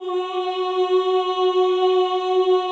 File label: CHO F#3, Ooh; Ooh + Ooh 2